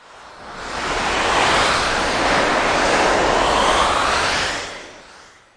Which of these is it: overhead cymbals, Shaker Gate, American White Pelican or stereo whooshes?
stereo whooshes